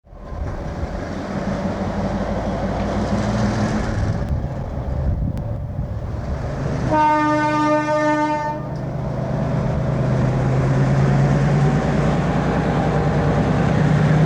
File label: Metropolitano G12 A606 leaving a station; The GM 12-567 engine in this type have little if any silencing and sound very throaty!; on the Haedo - Temperley line; Diesel Sounds